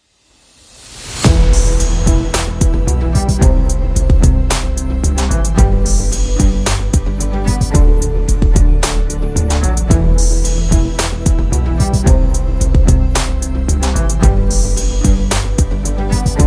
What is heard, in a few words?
Modern Trailer; self motivation